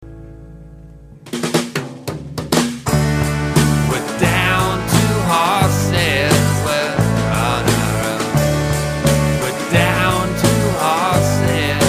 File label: drum